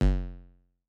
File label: 808 BD short, Roland-808 Drum Hits Bass Drum